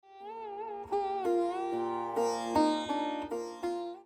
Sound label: brand music